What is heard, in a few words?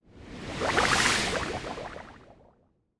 Engineer Spell Drone Drop Poison; Legend of Keepers